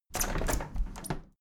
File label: window handle plastic squeak open tilt heavy; objekte; fenster